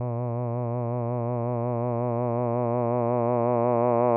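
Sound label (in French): A, un "a" d'une voix de basse, variation du coefficient de disymétrie alpha de 0.6 à 0.9, avec vibrato; sans vibrato; AteliersHenrich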